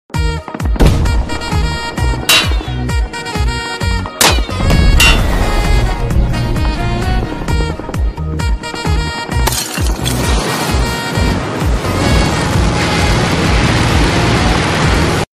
Sax; Space Marine

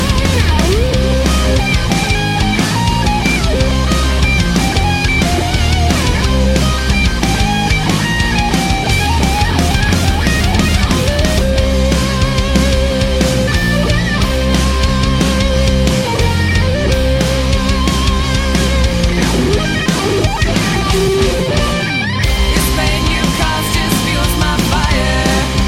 Guitar Solo; Vexus

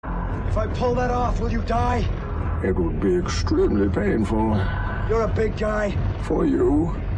extreme Pain; Bane

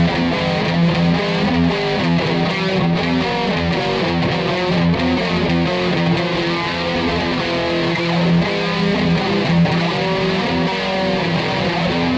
LOUD RIFF

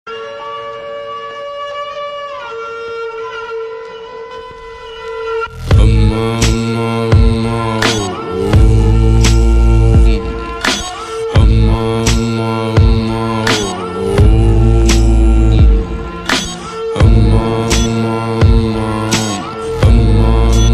ethnique mantra

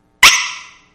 Squeek

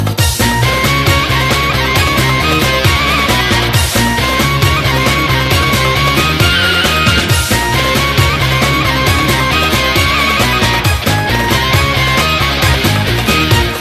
Balkan Turbo Folk

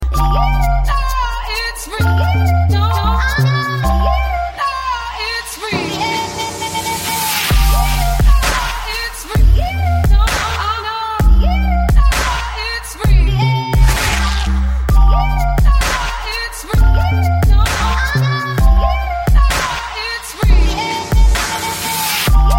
Dubstep

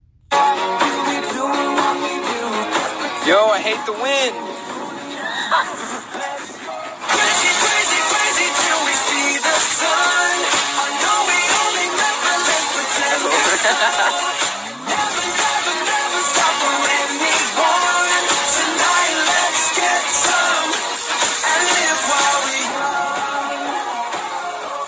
Laughter

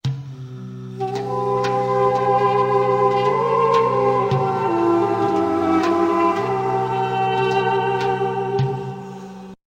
Duduk Intro

